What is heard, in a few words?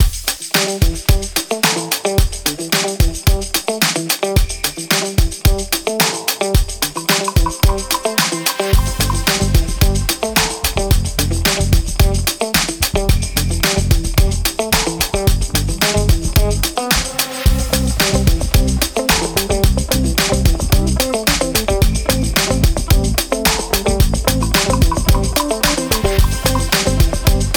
task pop bed